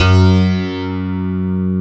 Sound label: PIA Old Smpl; Best Service Gigapack 1